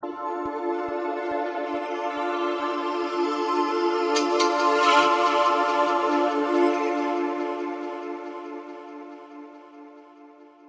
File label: harmor synth